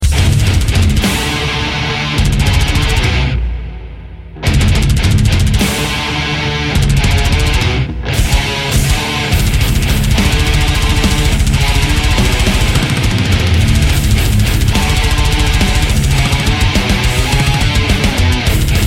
death metal

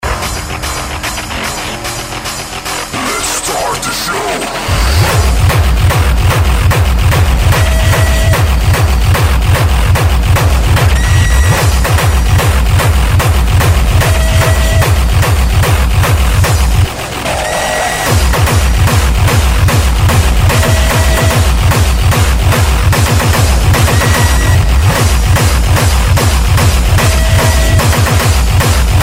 Hardstyle

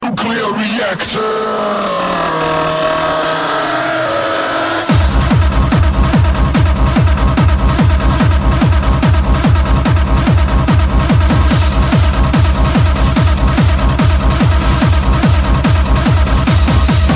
Hardbass

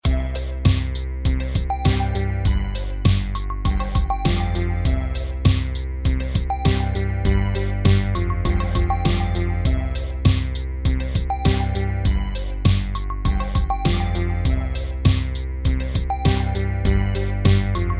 Upbeat

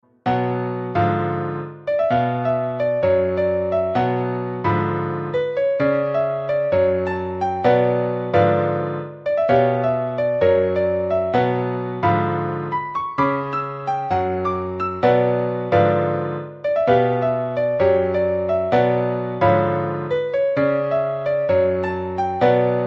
Theme Piano

